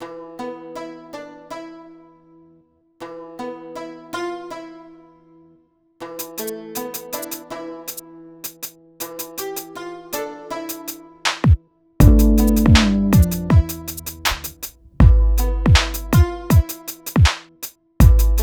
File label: 080 Emin, Hip Hop Exotica, Apple Loops; Big Fish Audio